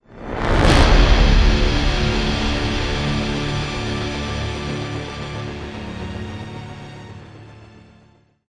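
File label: sting, brass swell; transformers war for cybertron gamerip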